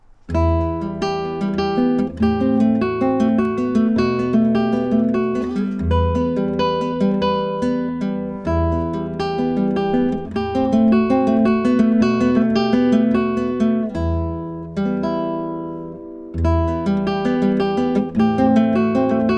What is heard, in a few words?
guitar